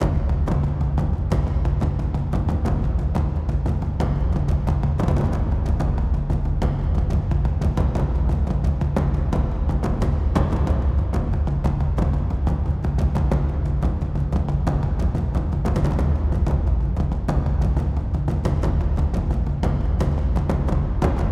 Action, Drums, Loop, 180BPM, Classic Horror Sci-Fi Music; Dead Ark